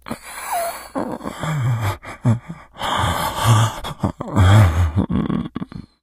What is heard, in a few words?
moan, infected; npc; idle